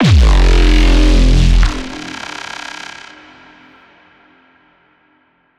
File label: bass hit cinematic F; Virtual Riot